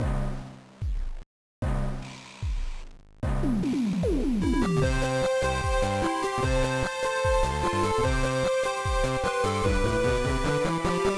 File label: BGM; kidou gekidan haro ichiza haro no puyo puyo 2005 gba